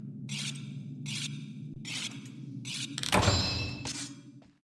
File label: Sharpening